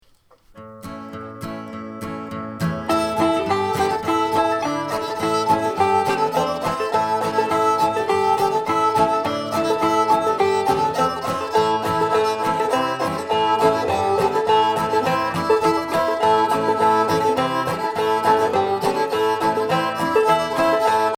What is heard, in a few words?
fiddle